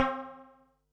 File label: Perc, Percussion and FX; Pearl SC-40